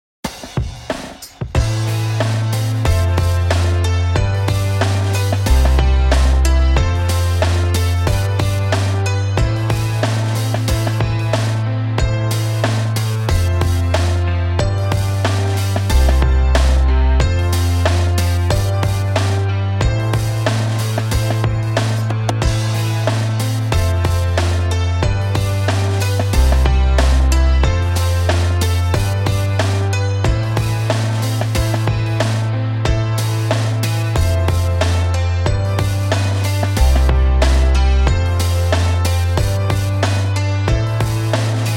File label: hip hop kontakt